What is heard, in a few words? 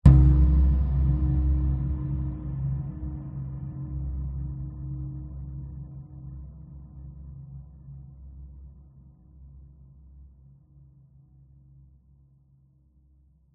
sound design cinematic hit dark reverberant shock terror suspense